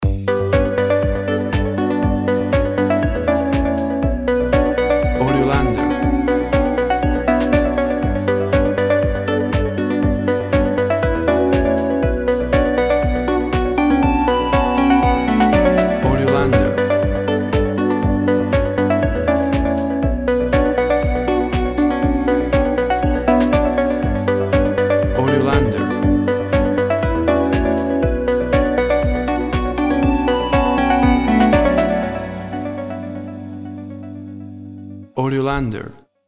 Tropical Chilled Electro House

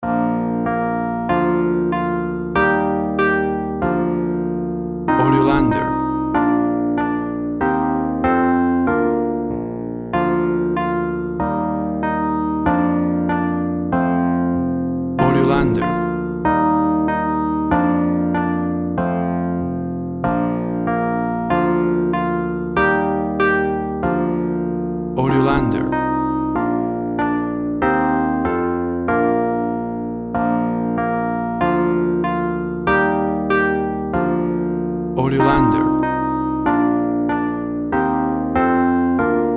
Twinkle Twinkle Little Star Wurlitzer

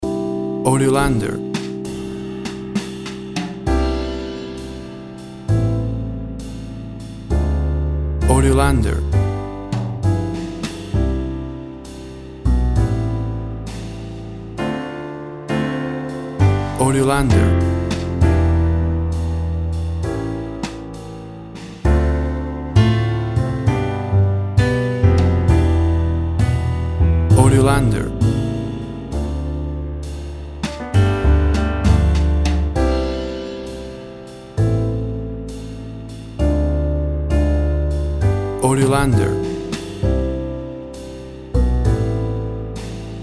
Welcome to the House of Jazz

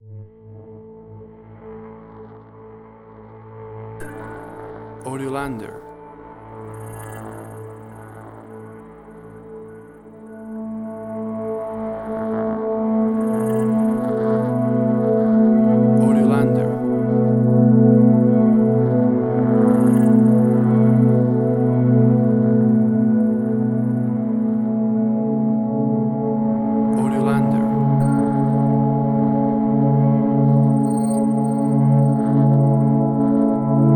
A Massage With Nature Ambience

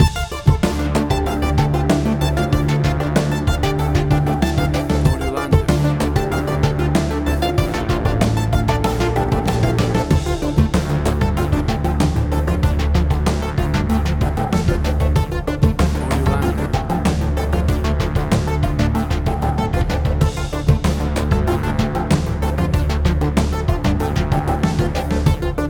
Atmospheric Fuzzy Synth Tension

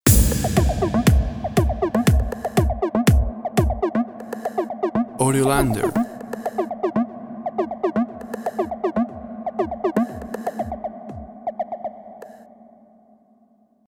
Top 40 Chart EDM Stinger

